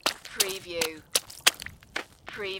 Snow and Ice, Stab ice several times with ice pick